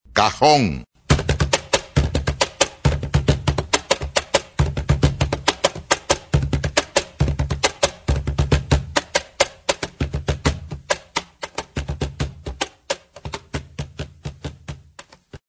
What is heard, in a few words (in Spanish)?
cajon